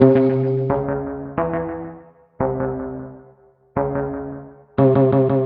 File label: String Tone; Sonic Foundry (Sony Creative Software); Stylus Pressure Urban Grooves on Digital Wax